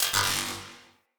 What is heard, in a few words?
Cannon Tesla Recoil; Weapons; Player